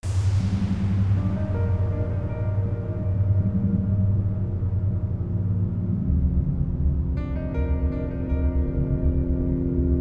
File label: Ambiance remix